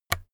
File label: keyboard external key release